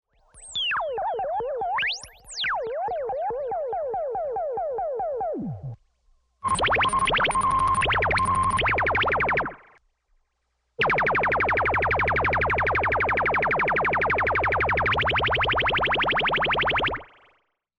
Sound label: Short Bursts - Three Different Guns; SOUND EFFECTS1